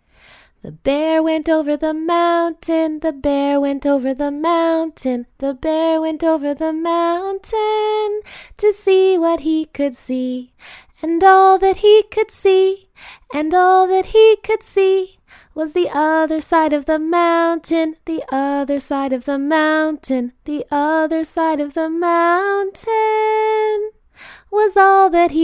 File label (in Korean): 영어 여 노래